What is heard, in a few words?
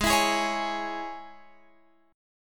Ab Suspended 4th Sharp 5th Ab, Guitar Open F; Absus4#5; strummed; chordshape